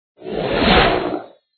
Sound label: sharkman